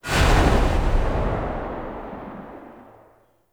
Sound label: Pod Shotgun Tail; Warframe; Weapons; Tenno